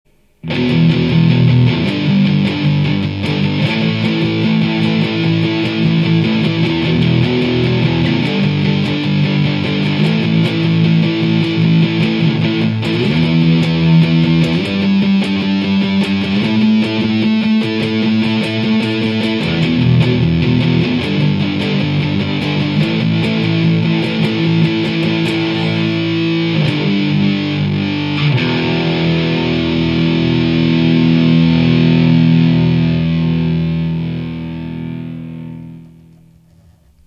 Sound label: power chords, guitar